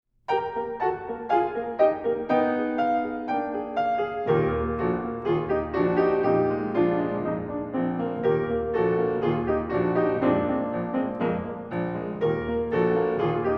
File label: Accompaniment